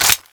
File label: mp9 clip out; weapons